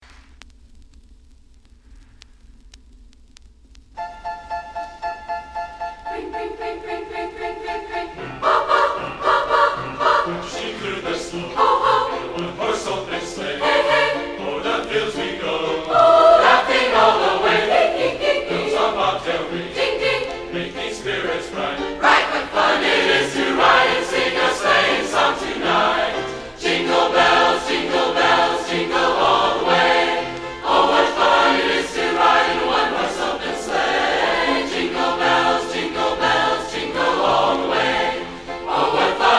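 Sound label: jingle bells by choir orchestra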